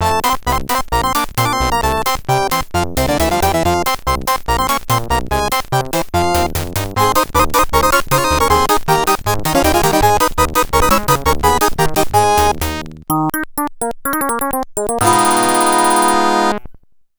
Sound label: All Clear; karnov; arcade